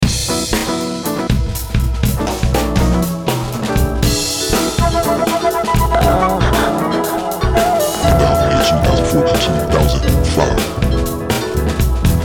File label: Blues Box E; Ronnys Blues Box